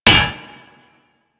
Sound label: Melee Hammer Impact Generic; Warframe; Weapons; Hammers